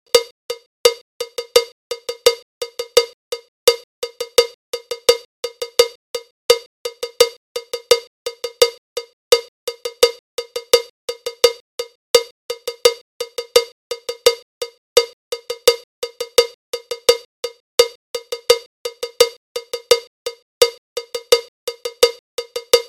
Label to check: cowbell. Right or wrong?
Right